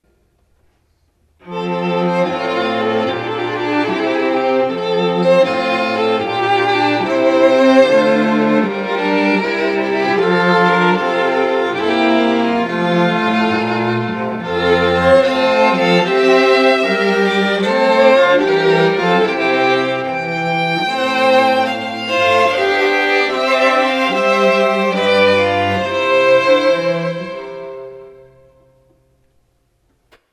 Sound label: strings